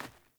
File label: Sandals Run Dirt, Footsteps; Characters; Player